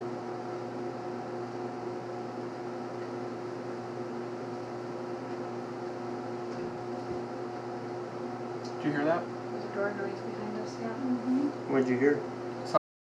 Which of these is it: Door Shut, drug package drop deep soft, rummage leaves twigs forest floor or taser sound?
Door Shut